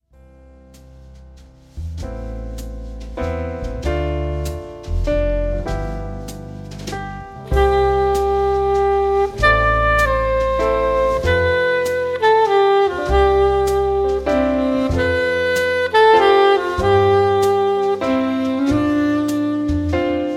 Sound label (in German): Saxophonistin